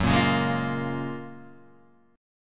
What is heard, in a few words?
Gb Major 9th, down, Guitar, Half Step Down